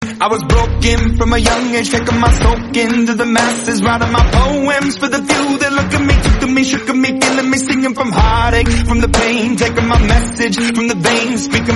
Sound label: english viral song